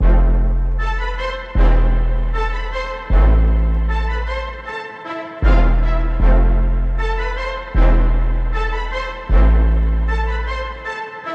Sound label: athmoz 155 bpm a min; monosounds